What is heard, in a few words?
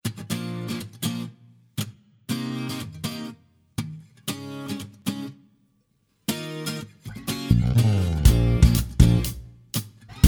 God is good Playback